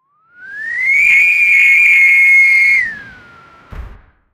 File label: atgm launch; whistle